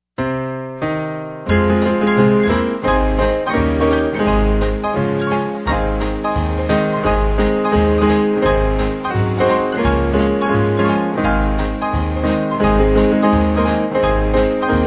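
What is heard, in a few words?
keyboard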